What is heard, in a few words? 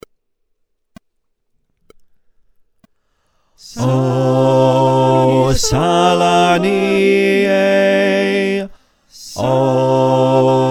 Bas